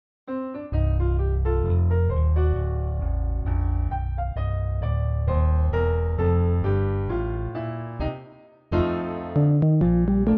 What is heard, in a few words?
floejte bas